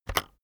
keyboard external enter press very fast

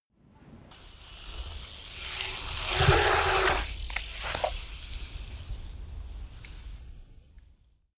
bmx maneuver bowl in and out

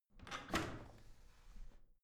washing machine drawer out squeaky soft

